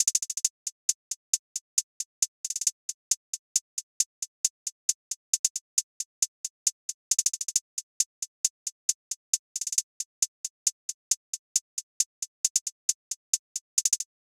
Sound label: Drum Loop - 135 BPM Hihat; Loop Stems; Cymatics; DOPE DRUMS VOL. 1; Chemist Drum Loop